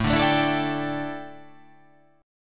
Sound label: A 7th Suspended 4th, A, down